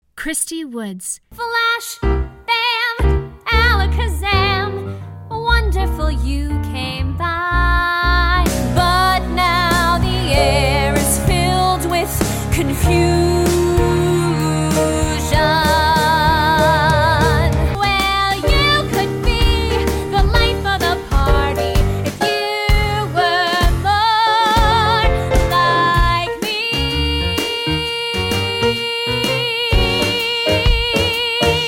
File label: Singing